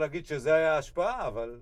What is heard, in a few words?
C Bass Bot